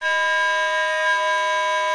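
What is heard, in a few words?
D sharp 5 with D6 with G6 with A sharp 6, multiphonics, flute; modern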